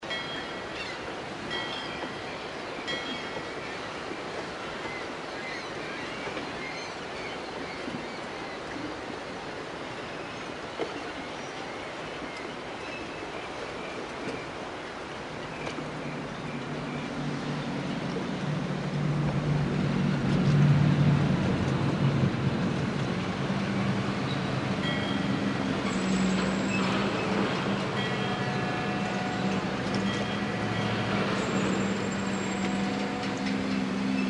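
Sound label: by water, ambience stereo; mohaa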